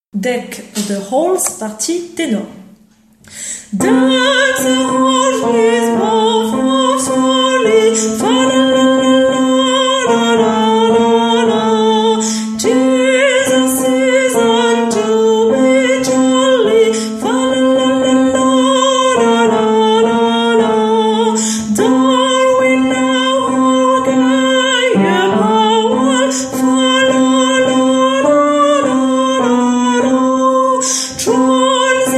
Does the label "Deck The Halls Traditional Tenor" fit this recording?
Yes